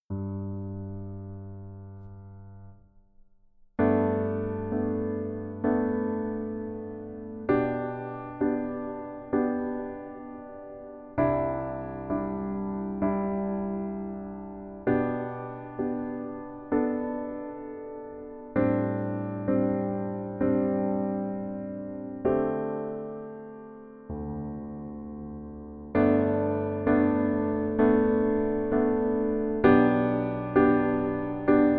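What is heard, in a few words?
Piano version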